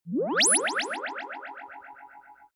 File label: spotting signal; swooshes-swishes